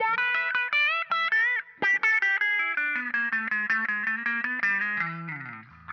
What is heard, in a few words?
Electric Guitar, Loops; Guitars